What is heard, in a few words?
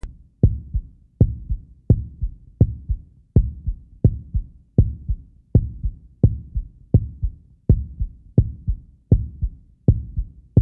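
zvuki serdca, heart sounds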